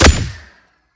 Sciweap Shot Small Gun P70 Sidearm

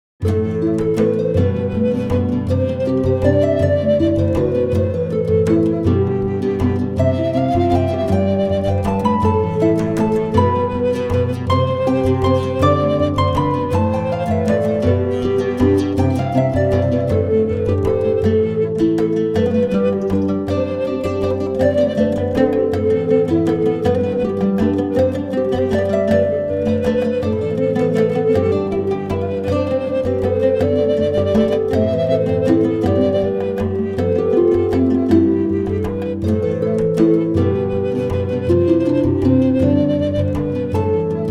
Tavern; Two Worlds 2

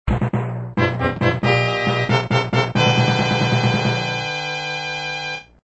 Boss Clear; A Koopa's Revenge 2

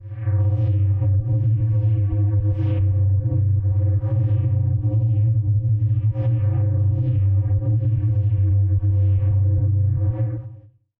electrical force field energy swirl

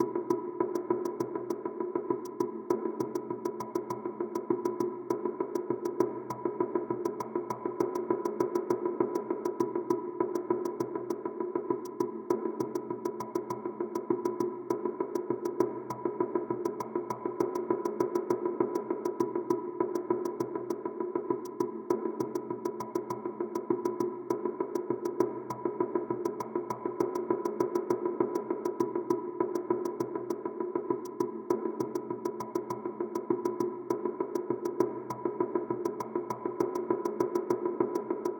Tension Percussion Curve; nova 111; ps vita

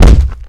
Body Hit

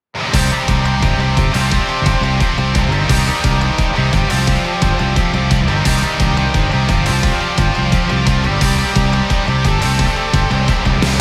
Battle Start; The Day I Became a God Original Soundtrack 2021

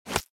SFX arrow body

